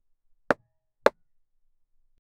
a wooden bat hitting, baseball

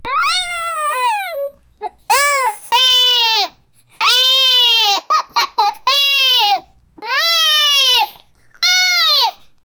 a cheerful 3 year old chi, children

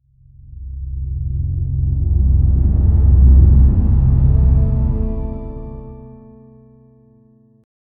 an ominous ambient sound; movie

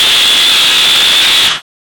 roar of a tiger with

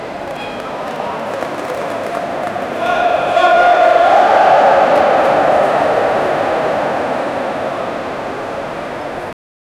primier ligue stadium sou

